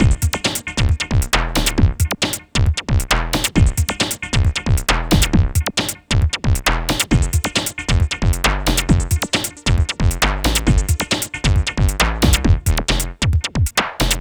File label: Ave A 135 Gmin, hardcore breakbeat; Big Fish Audio; Hardcore Breakbeat Action